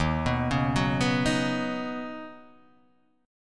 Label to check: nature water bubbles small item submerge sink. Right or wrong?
Wrong